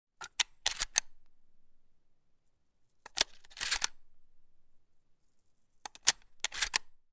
gun model 12; still north media

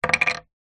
foley woggle wooden cub scout down on table; zapsplat

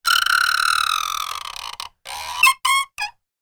wood pole turn squeak; foley; zapsplat

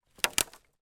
impact branch or log hit others forest floor

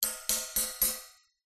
impacts metal cage small hit with metal object

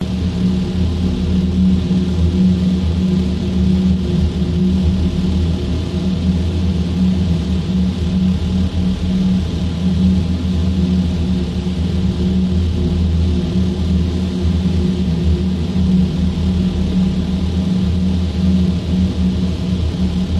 space drone; Audio Mangler

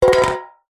tin metal cylinder lid on knock over on concrete; foley; zapsplat

